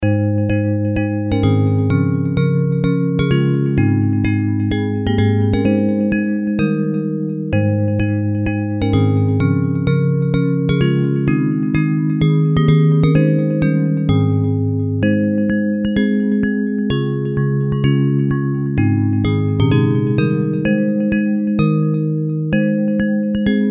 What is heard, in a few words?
Bells